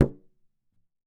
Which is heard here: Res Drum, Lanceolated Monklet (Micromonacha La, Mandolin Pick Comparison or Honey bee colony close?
Res Drum